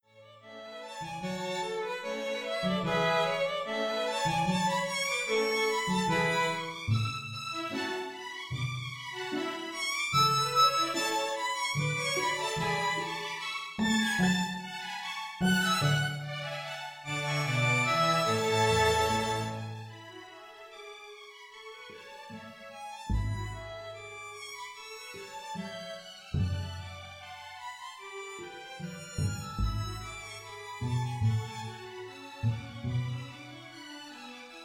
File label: bourree